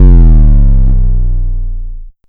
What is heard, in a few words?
Drop